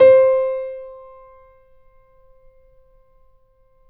Mp C4, Grand Piano; AKAI S6000 CD-ROM - Volume 2; Grand Piano 256M

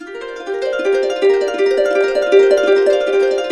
Complete Harps, F; USB Soundscan vol.62; AKAI

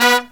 LOW HIT, Brass

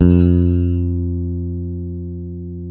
bass, sustain; Sampleheads; Will Lee Bass Library

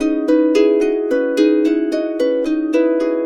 110, Complete Harps; USB Soundscan vol.62; AKAI

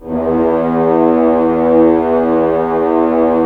Horns; Roland - LA Composer vol. 4

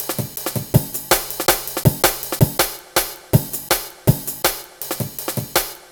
Drum Loops, Jungle, 162; Scotty

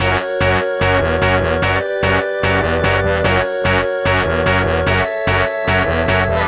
Melody, Cinematic Funk Urban Tone Poems; Sonic Foundry; Sony Creative Software

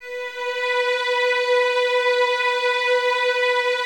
B 4, String Ensembles Violins; Miroslav Vitous; 11 Violins

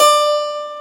HARPSICH

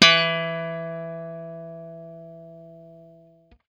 BLUE POP, Guitar & Bass; Quantum Leap; 90's Sample CDs

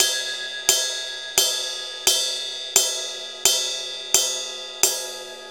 Ride Cymbal Pattern

